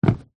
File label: footstep single wood decking boot; foley; zapsplat